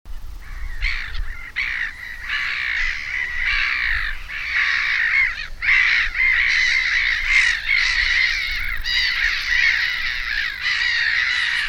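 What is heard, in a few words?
Larus ridibundus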